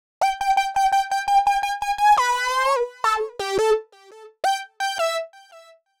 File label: Synth line 85 A, hiphop 85bpm; musicradar